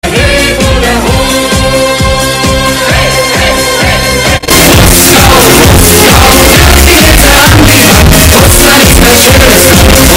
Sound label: bass boosted